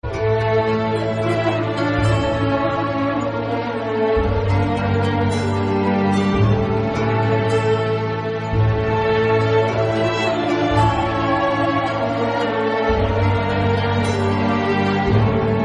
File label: Instrumental Guitar Music